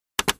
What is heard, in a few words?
Mech Button Metal, switches